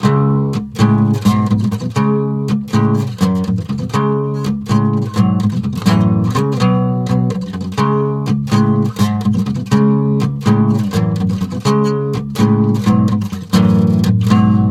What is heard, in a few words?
guitar